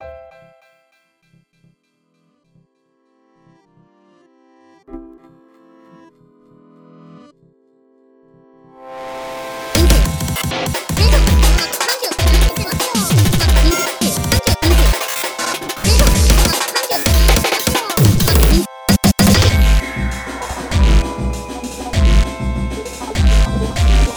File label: rain beat